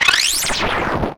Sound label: UFO Sweep; Transitional Elements